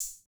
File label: Open Hat Hit, Bmin; Hip Hop